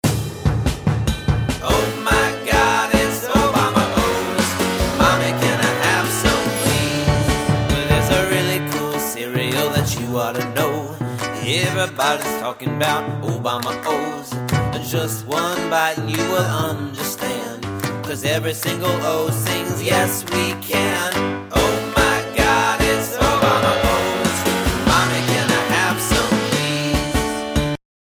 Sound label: obama jingle